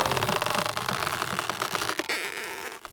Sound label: Stretch long; Ed Edd n Eddy; Adromulus Pack; EEnE